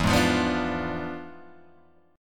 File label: E 7th Flat 9th Guitar Open G, chord